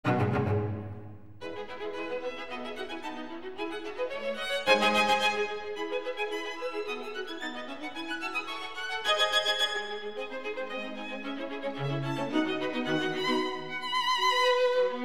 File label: String Quartet in C-Sharp Minor, Presto; String Quartet No. 14; Op. 131; Ludwig van Beethoven; Beethoven - String Quartets Nos. 1-16